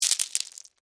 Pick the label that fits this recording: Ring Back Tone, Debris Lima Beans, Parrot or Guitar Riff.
Debris Lima Beans